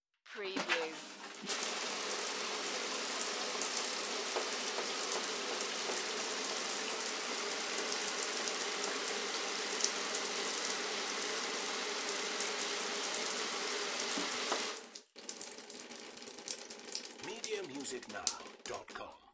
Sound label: Running the Electric Shower; Household Sounds / Bathroom Sounds; beanstalk audio